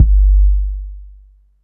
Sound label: BOOM, Techno 138 BPM; Best Service ProSamples vol.54; AKAI